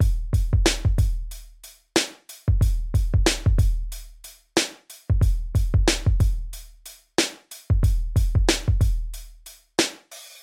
Hip Hop Beats; ударные; rap

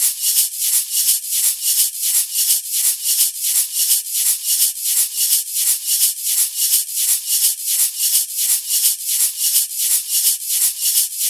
Guiro Shake 85 BPM

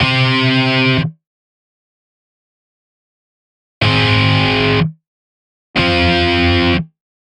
Hellfreeze, breakbeat

